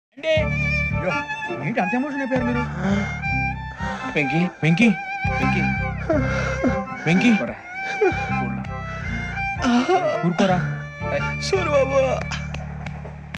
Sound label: emotional background music ringtone; venky movie